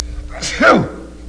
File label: sneezing